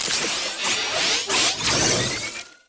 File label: Inspect Loop; cstrike; valorant; rgx karambit